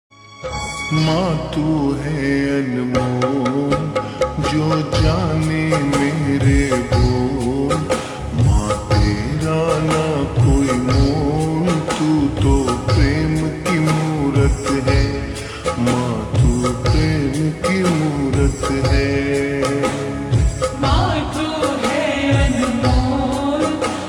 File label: Slow Reverb Version Ringtone